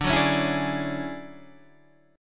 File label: D Major Sharp 11th D Guitar Drop D